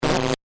Static Voltage, Production, Element, Static, Voltage, Buzz; BlastWave